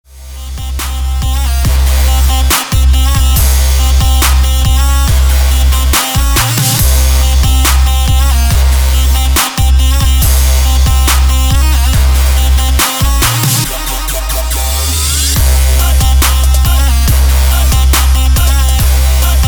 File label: trap